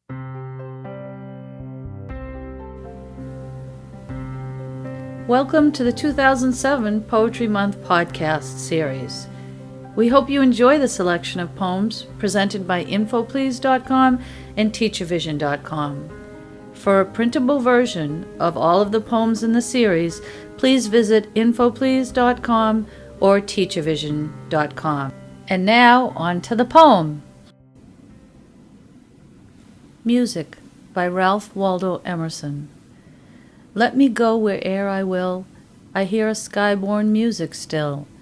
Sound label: music, poems